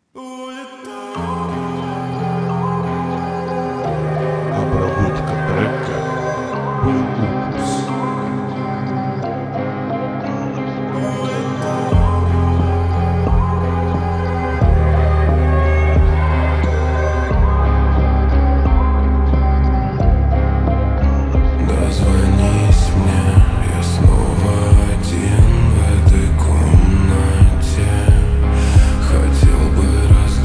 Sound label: slowed reverb